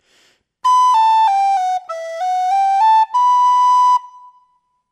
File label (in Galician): ligado, Pito Galego; articulacion